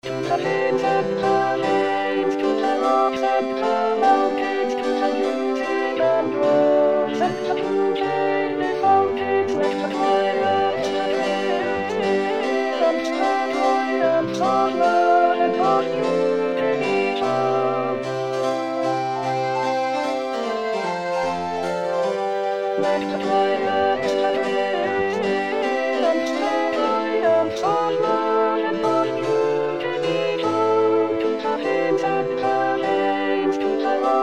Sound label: to the hills, Alto